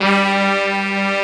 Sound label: brass, G2; Best Service Advanced Media Trax Modern Composer